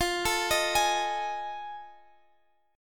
F 7th Sharp 9th, Guitar, Half Step Down

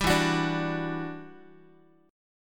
E Minor 9th, down, Guitar; Em9 strummed; 10,12,9,12,x,x

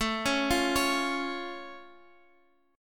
C sharp Augmented A, guitar chord

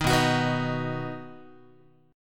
B Major B, Guitar; strummed; 6,7,9,9,7,x; JGuitar

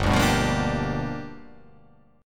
G 7th Sharp 9th G chord

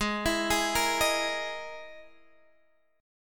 E-Major Sharp 11th G sharp; EM#11/G#; arpeggiated; JGuitar

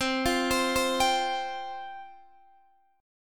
C Suspended 4th C, Guitar, Open D

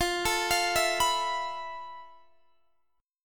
F Major Sharp 11th, Guitar DADGAD

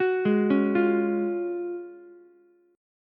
F sharp Major 7th Suspended 2nd, Ukulele; arpeggiated; JGuitar

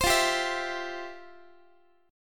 F Major 7th Suspended 2nd F, Guitar; strummed; JGuitar

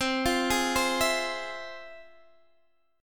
F Minor Major 7th C, guitar chord

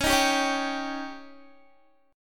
Db Suspended 2nd Flat 5th Db down Guitar; strummed

